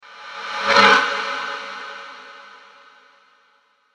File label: Whoosh A Scrape From Horror; Whoosh Sound Effects; Andrew Fleming